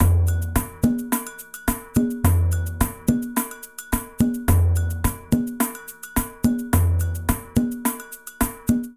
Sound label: Afoxe A-107bpm, Latin Percussion; Ableton; Factory Packs